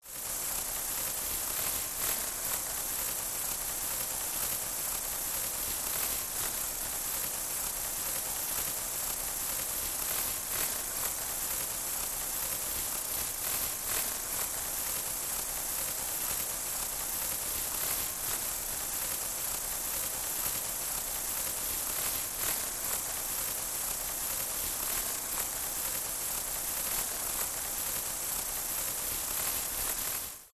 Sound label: science fiction forcefield electricity